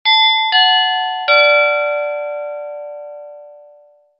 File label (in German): Gong